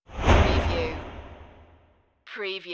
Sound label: Rush